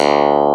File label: CLAV; FUNK